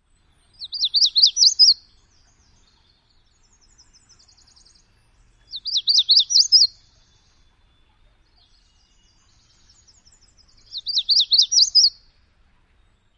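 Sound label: Magnolia Warbler, songs number 3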